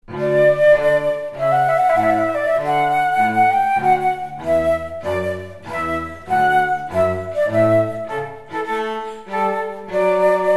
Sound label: flute cello duo, trumpet voluntary